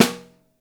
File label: SN1 Overhead, Snare